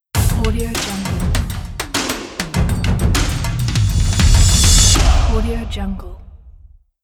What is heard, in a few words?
logo epic percussion